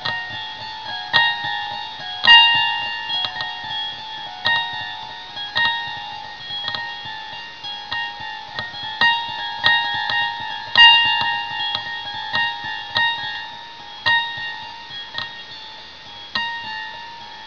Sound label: Zither